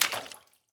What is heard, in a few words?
Footstep Water; Footsteps